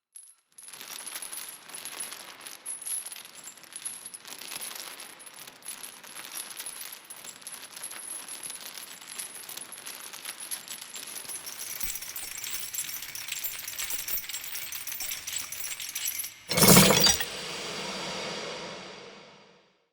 Vision Snake Chains; Synod; Bromius

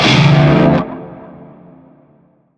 Shawzin Maj Chord; Warframe; Narmer Shawzin

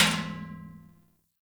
Metal Trash Can Impact

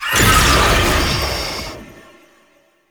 Unyielding Gallop Cast; Warframe; Dagath

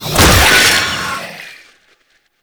Undead Owl Attack; Warframe; Dante

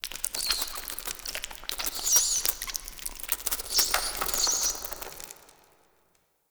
Clan Dojo Misc Mice; Dagath; Warframe; Ambience

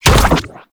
Ink Rifle Reverse Ink End; Warframe; Tenno

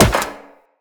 Wall Jump Metal Perforated; Tenno; Footsteps; Wall Run

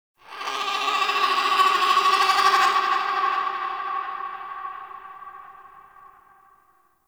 Ext Creature Distant Mid; Ambience; Misc Outdoor; Warframe; Infested Eris

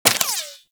Bullet Impact Debris Wood

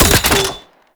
Grenade Launcher Mech; Weapons; Scaldra

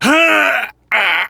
Laugh; Kuva Lich; Persona D; Ability Lift

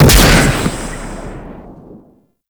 Rifle Shot Alt, Weapons; Warframe; Zariman Grn Wraith Rifle

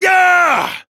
Exertion; Warframe; Entrati Lab Quest; 2023 TennoCon; Arthur

